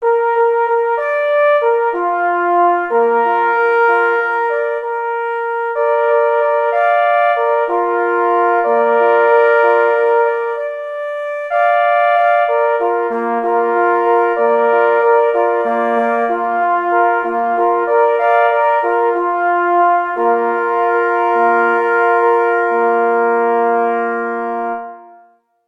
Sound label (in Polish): Hejnal na 3 plesy; K.E. Kroskowski; Hejnal KL Slonka w Sierakowicach